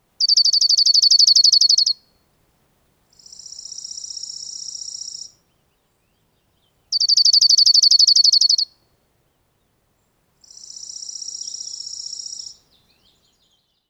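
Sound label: Dark-eyed Junco two males countersinging; NY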